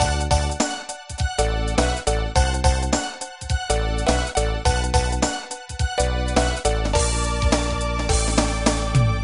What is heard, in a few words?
theme loop positive pop